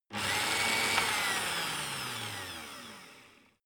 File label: electric whisk rev rear; haus-garten; kueche